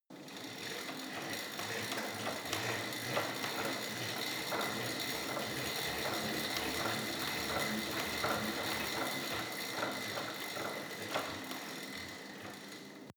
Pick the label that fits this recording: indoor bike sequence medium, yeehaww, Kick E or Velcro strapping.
indoor bike sequence medium